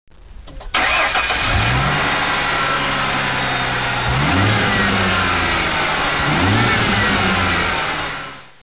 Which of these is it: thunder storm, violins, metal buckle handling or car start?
car start